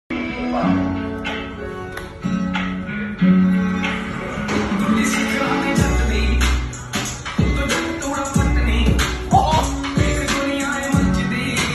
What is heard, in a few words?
punjabi song; viratkohli; anushkasharma